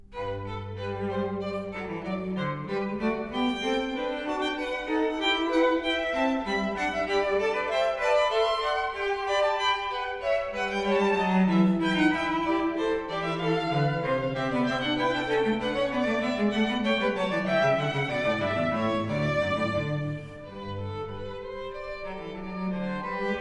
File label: Variation 9 Canone alla Terza, strings; Bach; Goldberg Variations; Sitkovetsky; NES Orch